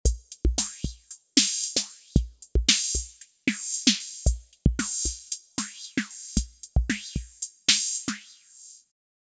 drum machine wet

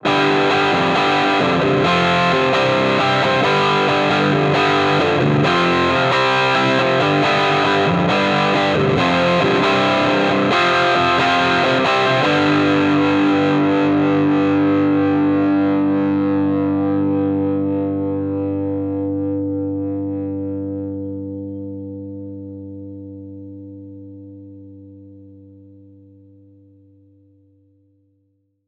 rock les paul bridge pickup

